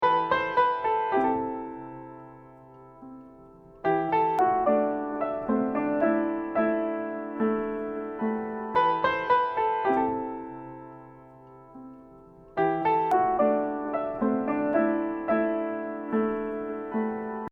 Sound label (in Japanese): ピアノ